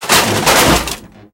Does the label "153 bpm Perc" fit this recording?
No